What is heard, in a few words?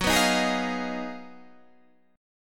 G sharp Minor 13th F sharp, guitar chord; G#m13/F# strummed